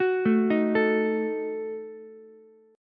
Eb Diminished, Ukulele; Ebdim arpeggiated; JGuitar

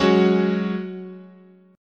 Gb Suspended 2nd, chord, guitar

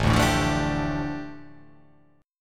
A 7th Suspended 4th Sharp 5th, A, down

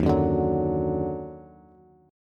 D sharp Minor Major 7th D sharp down Bass; strummed; chord

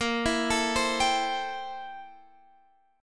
A-Minor 7th Bb; Am7/Bb; arpeggiated

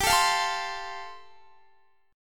G 7th Suspended 2nd Suspended 4th, Guitar

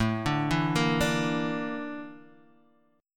A sharp Major 7th Flat 5th A

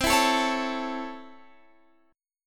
Db Minor Major 7th Sharp 5th C

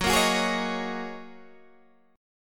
F sharp 6th Add 9th F sharp Guitar DADGAD

